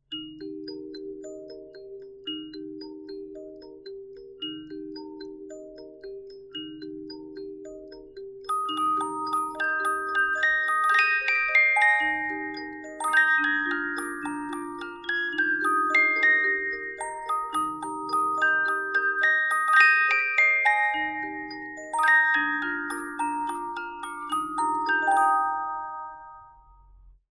musicbox

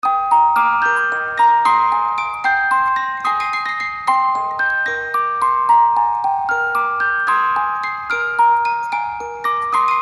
music box

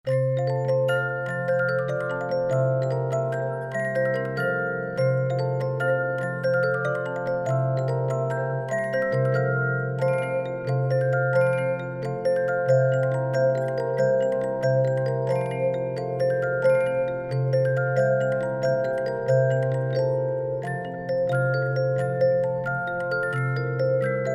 Invitation to Dance, music box; SANKYO

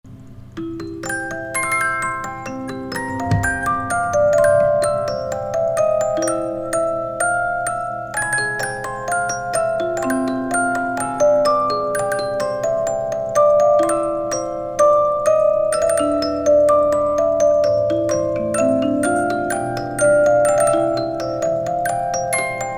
piano concerto 72 note, musicbox

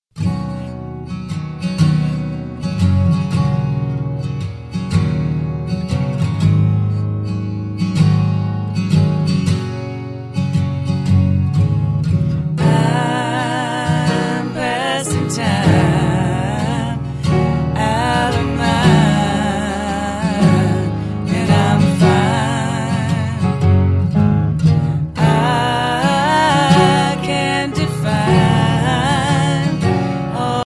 Vocal

